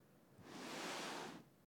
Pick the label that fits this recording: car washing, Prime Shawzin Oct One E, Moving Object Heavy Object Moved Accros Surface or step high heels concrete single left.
Moving Object Heavy Object Moved Accros Surface